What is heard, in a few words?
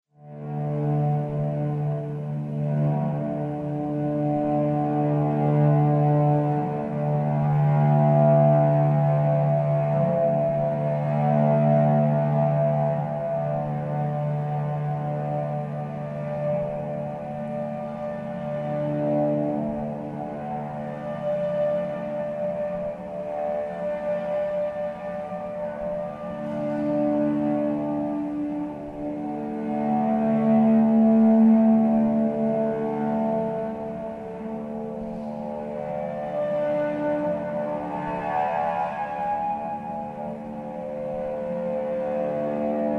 cristal cello